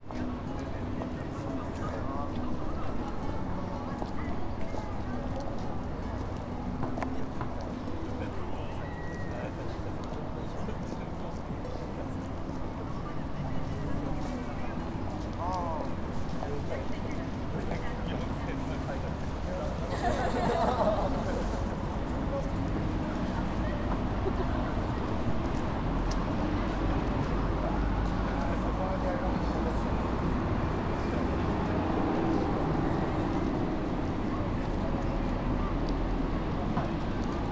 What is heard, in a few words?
station candle night, Fukushima